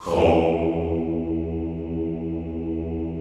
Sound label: "houuu" E1, classical choir; Best Service; Extended Classical Choir